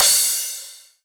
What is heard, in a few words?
crash